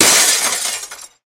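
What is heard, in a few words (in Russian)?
вдребезги разбившаяся посуда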